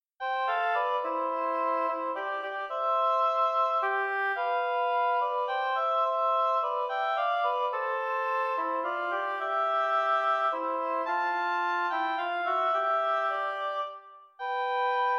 Intermediate oboe duo C, Intermediate oboe duets in C major